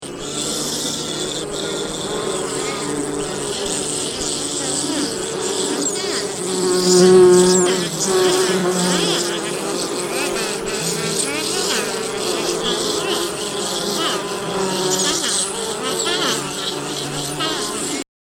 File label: Bees, ringtones